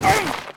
FALL, Rider

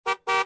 horn toot; Soldier Of Fortune II Double Helix; XBOX

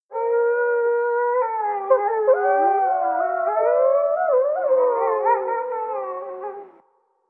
Wolves Distant; Animals; XBOX; BassProShopsTrophyHunter2007

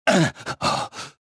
choke; reborn

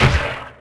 land, Impact; Splashdown; XBOX

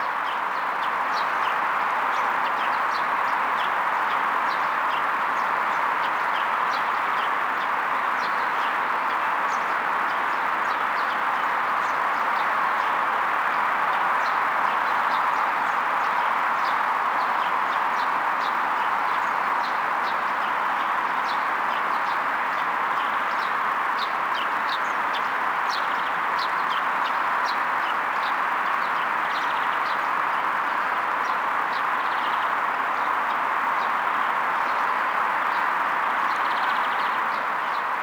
Bird; Project Gotham Racing 2

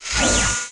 Mech Move Servo; Tallan Mech; Aliens

